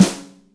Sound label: Loosy, Yamaha DTXpress Acoustic Snare